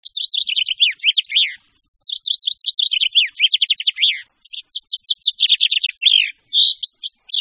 Pinson, Oiseaux; Sons Oiseaux

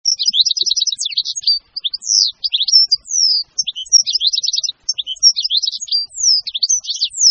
Chardonneret, Oiseaux; Sons Oiseaux

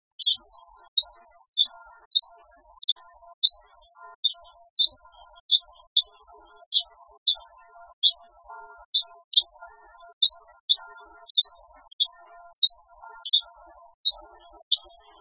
moineau, Oiseaux; Sons Oiseaux

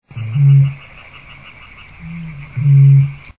butor etoile, Oiseaux; Sons Oiseaux

